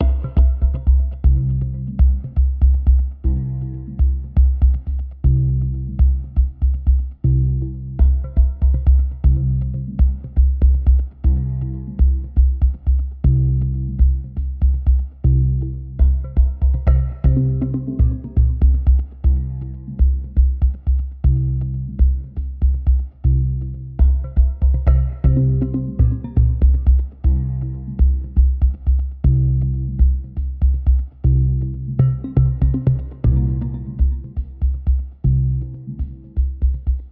Arpbeat

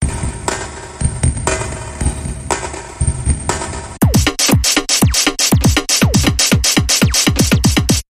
Near And Far Percussion Bumper

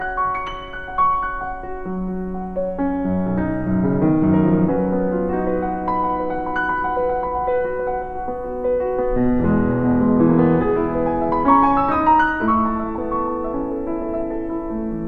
Nocturne

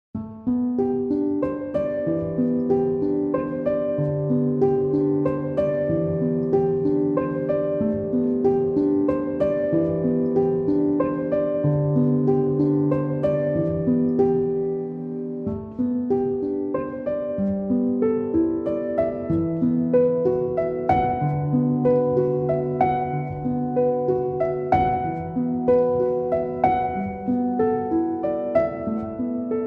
Piano Only

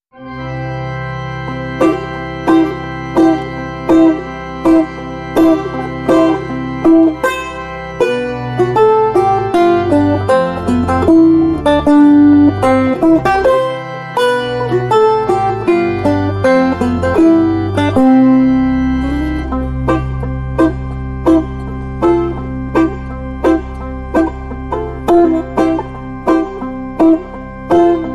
Wedding March; Trad (PD)

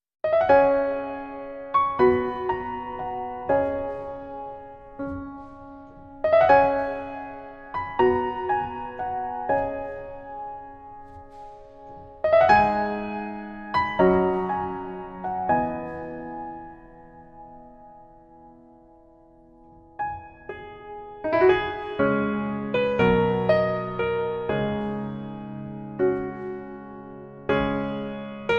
Solo Piano Mix

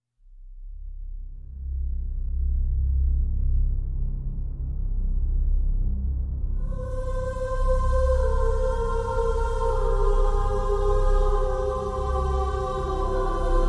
No Strings

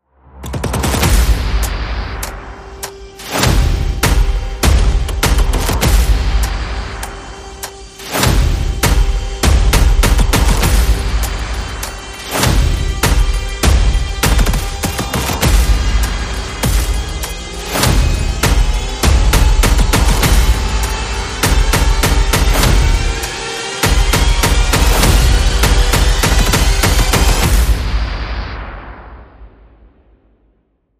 Dark Cycle, Percussion and Effects

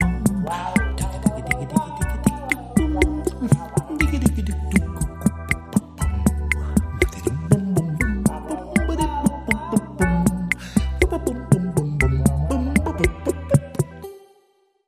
Percolating Chill

